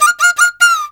CHINA, Heart of Asia; Spectrasonics; CHINAFLT

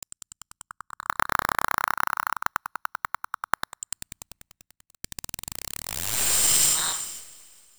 loop, techno atmos

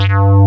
UNDER X BASS, Synthsonic; Pro-Rec - Synthsonic; BASSES

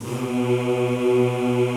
B1 R, Classical Choir; Best Service; Extended Classical Choir

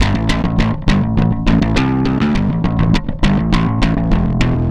Distorted Bass, Electric Bass; Sonic Foundry (Sony Creative Software); Leo Cavallo Bass Taster

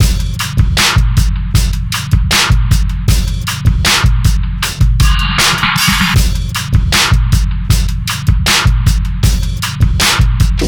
Beat Loops; DeepRooted; Zero-G - Monster Beats

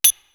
FING CYMBAL 2; East West; Global Sounds

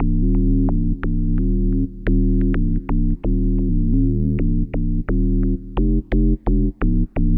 bass, Bbmin; Big Fish Audio; Hardcore Breakbeat Action

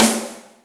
OpnRimB, Yamaha DTXpress, Acoustic Snare

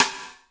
SIDESTICK; InVision Interactive; Lightware VOL-1

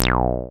SYN 303 C2; Best Service Gigapack 1; SYNTB303

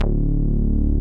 BASS, Roland D-70; Drums

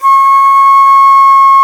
VENU C#5, Winds; E-MU Producer Series Vol. 7 – Old World Instruments

